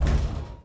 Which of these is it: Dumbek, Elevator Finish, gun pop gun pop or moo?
Elevator Finish